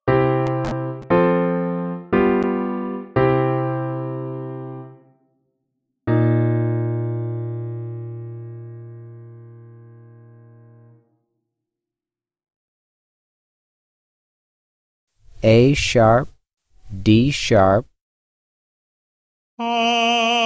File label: A#1 D#3 Grandeur Piano 2 Note Singing; Level One “Singing” Example; ear training two note